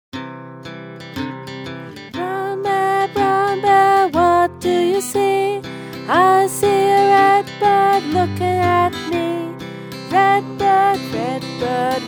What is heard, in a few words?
brown bear song